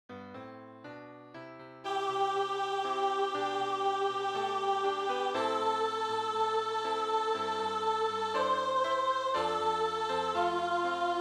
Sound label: When will Mezzo1